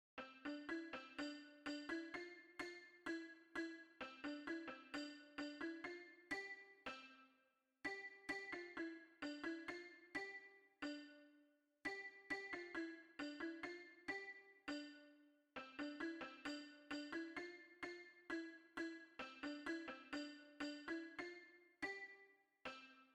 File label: little toy piano